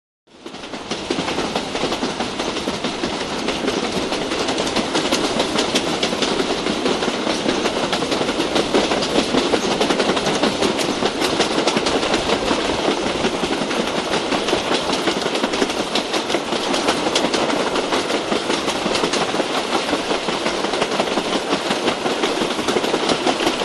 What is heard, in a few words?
Train Constant (Interior) Steam Train At Constant Medium; Hollywood Edge 3-D SFx